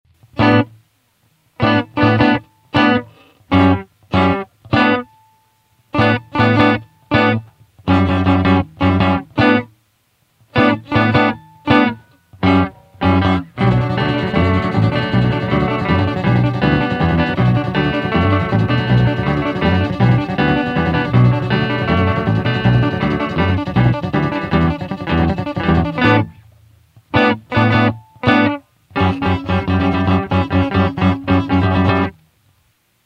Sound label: string quintet